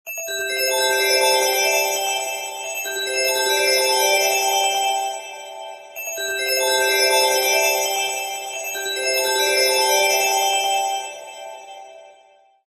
chimes ringtone; sony ericsson

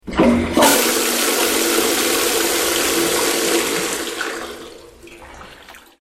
toilet flush ringtone

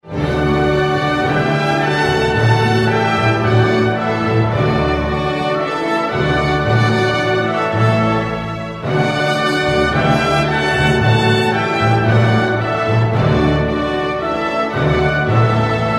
european union anthem

